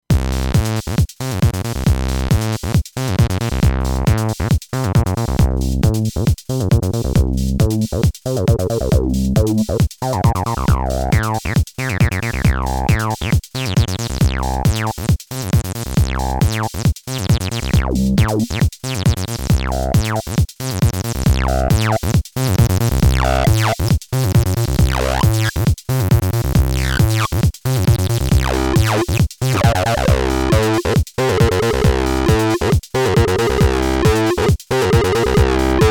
Bass Synth

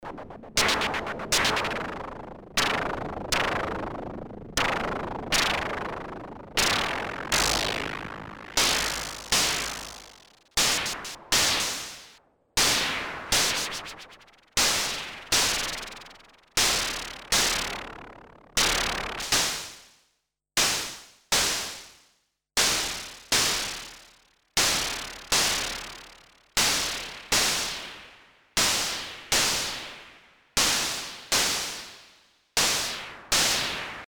VCO Mode F LFO Sweep

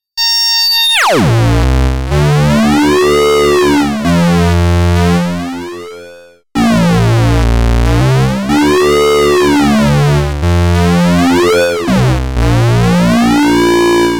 Klavis Module Self Sync Pulse Modulated Carrier F

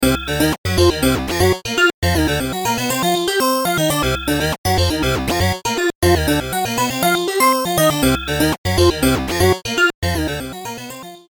Metallic Machines

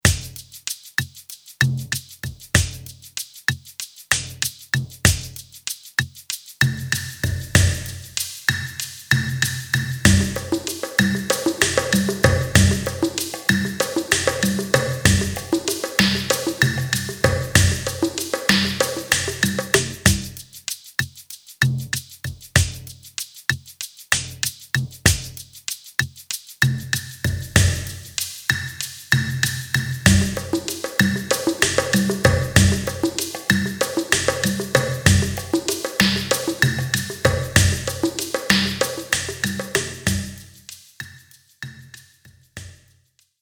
Lexicon Alex Percussion Plate

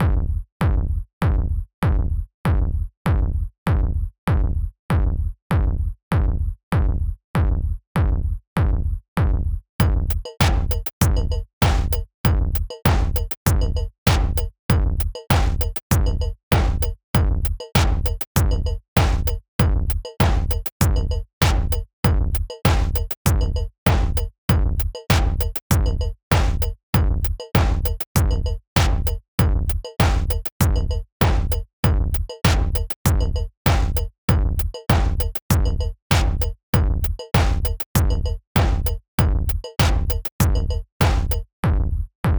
RAW Kick

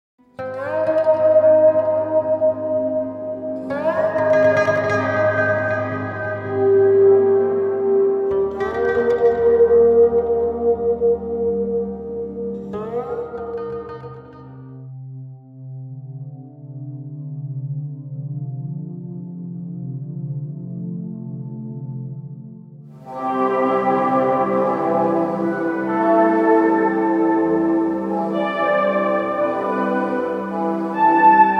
Q Multilayer Dreamscapes

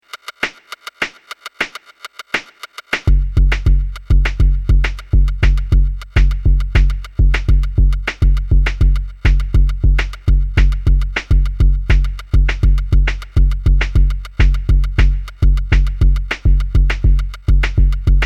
Euklid Drums

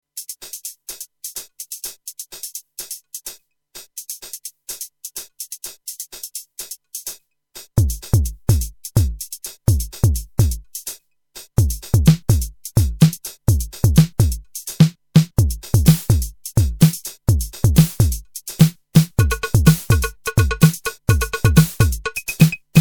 Drum Schlagzwerg

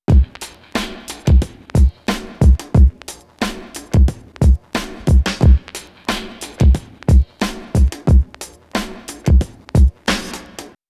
Loop Dry

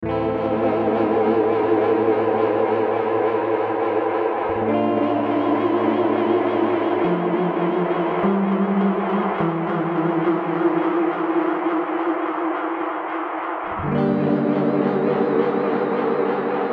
Seymour Duncan Dark Sun

